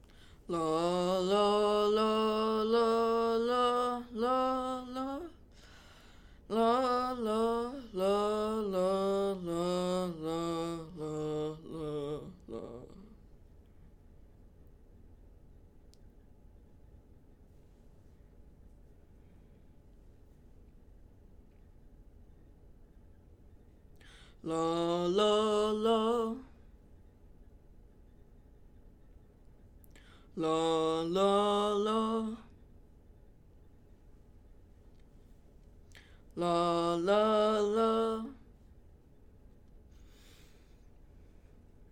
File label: Baritone; allstate2024 jrhigh; Audition